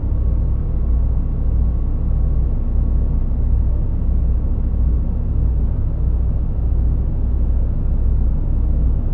drone machine

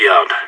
yard; SAS; radio

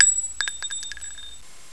pl shell, weapons

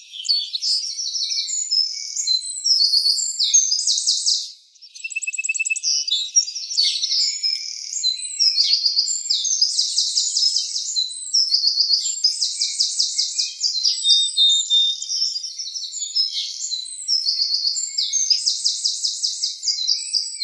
birds no wind

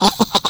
skelly; skeleton

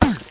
snowhit human; snowwars

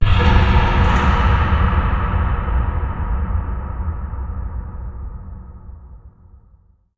amb boomer

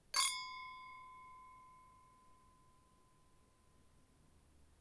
crystal; cy crystalcave

